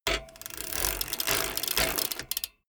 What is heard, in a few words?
bmx bike pedal brake short